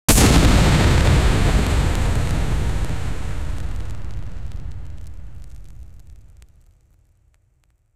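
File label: fireball full sound roaring, spell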